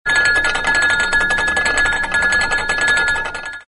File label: vibrating glass